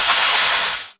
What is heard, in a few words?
water crops; Farming